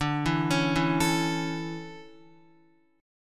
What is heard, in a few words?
D Major 7th Suspended 2nd, Guitar; arpeggiated; JGuitar